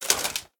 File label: mvt armor arms; smite; god arthur